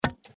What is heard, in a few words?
hit